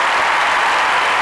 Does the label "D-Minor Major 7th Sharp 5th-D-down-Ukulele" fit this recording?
No